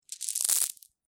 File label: Marbles Or Pebbles Rattle; Several marbles or small pebbles being dropped; Miscellaneous Toy Sound Effects; LynneMusic